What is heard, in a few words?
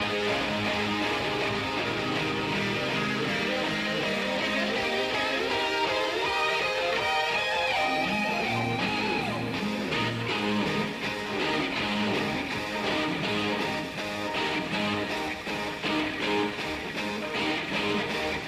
Instrumental